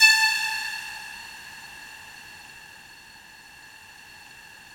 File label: FOGATK3 A4, Yamaha VP-1; Music Store; 90's Sample CDs; FOGATTACK 3